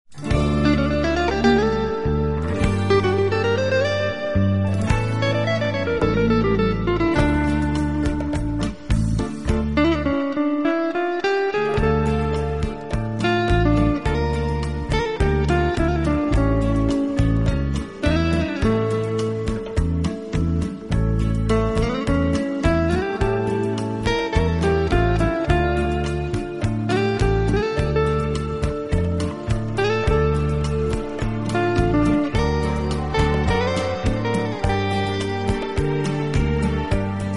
Guitar Ringtone Bollywood

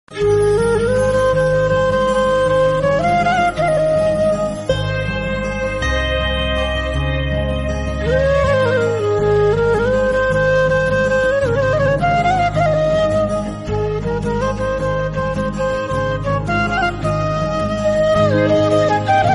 Instrumental

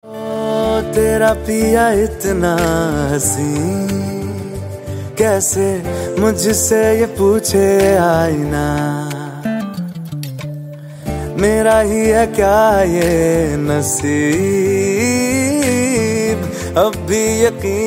Bollywood ringtone

